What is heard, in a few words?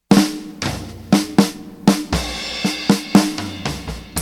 drums